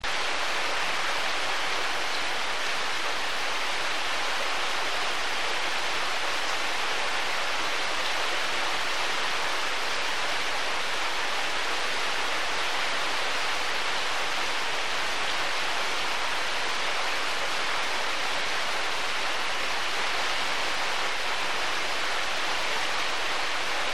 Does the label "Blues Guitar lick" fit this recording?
No